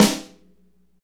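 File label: SNR snare, Motown; Northstar; Drumscapes Roland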